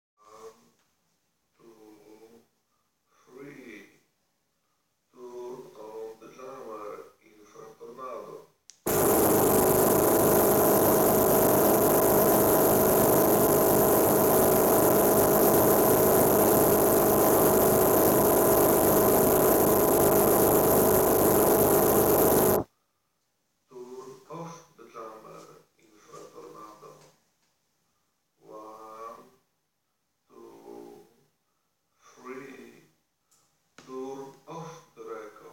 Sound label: real test 1 meter; INFRATORNADO; Samsung Galaxy S4 GT-i9515